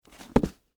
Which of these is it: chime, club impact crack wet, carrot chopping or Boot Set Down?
Boot Set Down